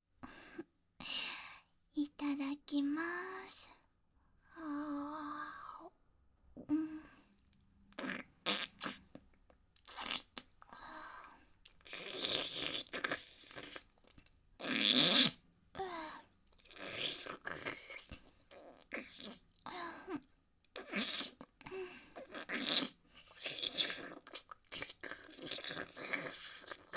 フェラチオ; SEなし

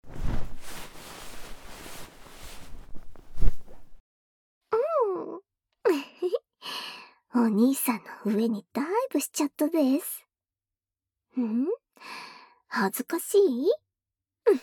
耳ふー＆耳キスパート, ASMR

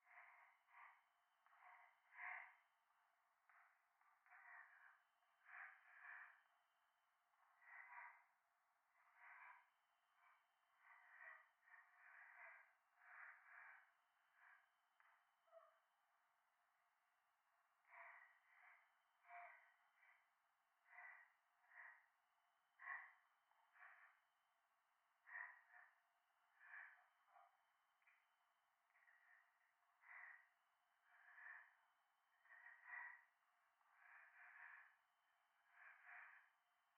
左耳 快感 吐息 山奥; 夢の中

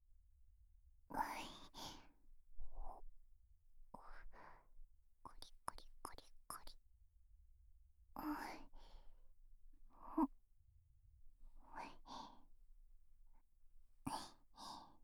左耳 吐息 ループ音源

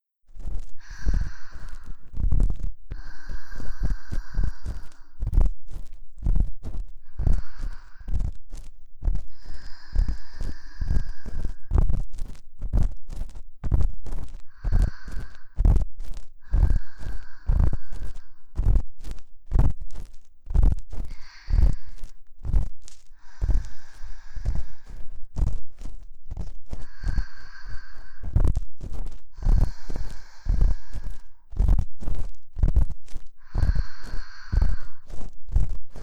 セリフ無耳かき音, ASMR

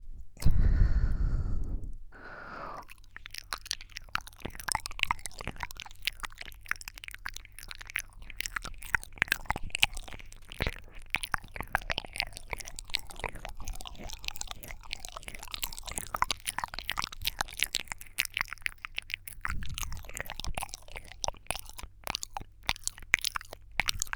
口音コース

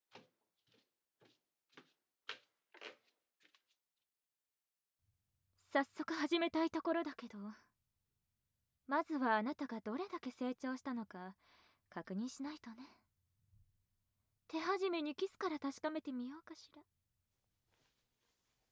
女神の濃厚ディープキス＆睾丸マッサージ, ASMR

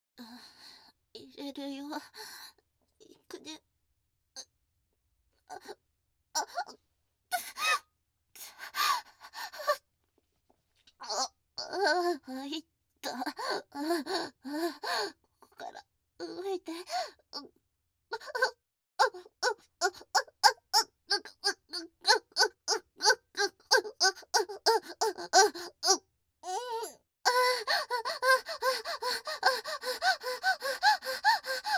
挿入 喘ぎ

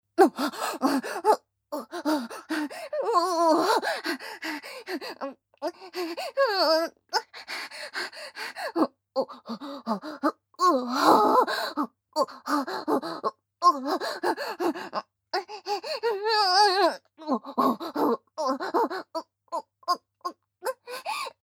オホ声; ポーラ（妹）

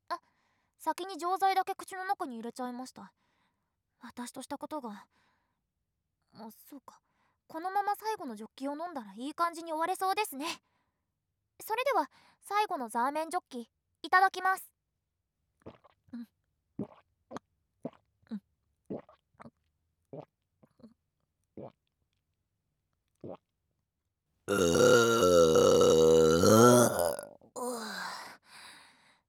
ゲップ; 最後のザーメンジョッキでおクスリごっくんゲップ！！！; ザーメンゲップ集

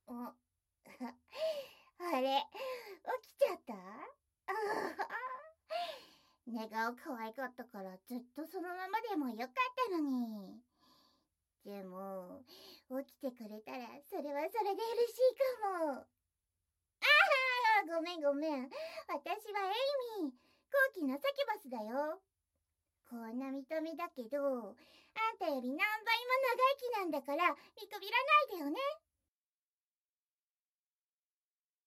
サキュバスの長い舌で耳舐め（耳舐め キス 言葉責め 媚薬 女性優位）; SE入れ前

